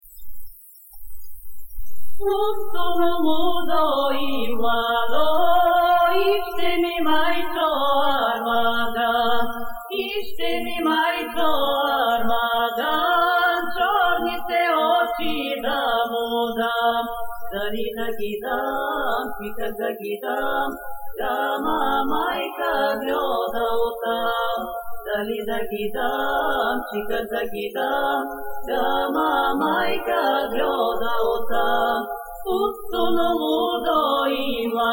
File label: Bulgaria Pustono Ludo (Pravo Rhodopsko), Folk Dances; Petaluma; 2nd String Folk Dances